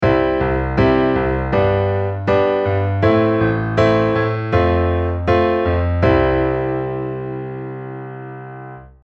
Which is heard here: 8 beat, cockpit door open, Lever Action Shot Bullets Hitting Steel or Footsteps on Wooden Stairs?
8 beat